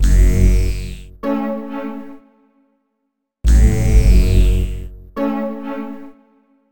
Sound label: Buzz Stroke; Sonic Foundry (Sony Creative Software); Club Joints and Street Anthems; Razor Edge